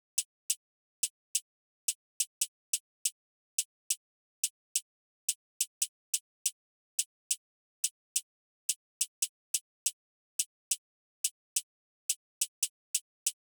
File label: Drum Loop - 141 BPM Hihat, Drill; Loop Stems; PARADOX II - Drum Loop Collection; Cymatics; Harpoon Drum Loop